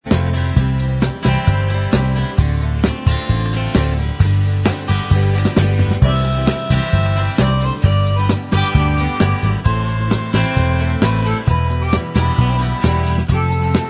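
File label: instrumental track, backing tracks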